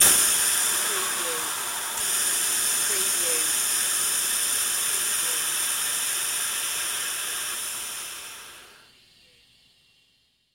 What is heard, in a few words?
Pneumatic Valve